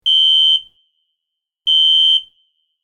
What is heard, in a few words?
Alarm Whirring Beep; hi-tech-various